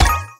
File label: collect; pinball